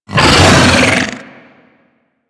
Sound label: Pet Vocal Angry